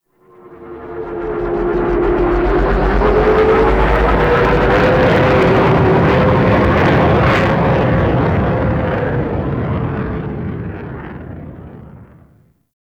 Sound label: Ship Platform, Dropships; TheTeacher